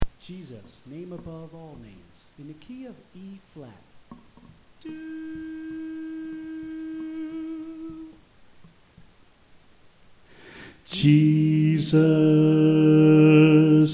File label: Bass